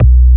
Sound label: BASS, 160 BPM, Drum & Bass